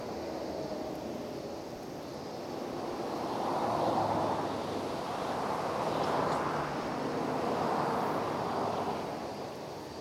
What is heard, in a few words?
country car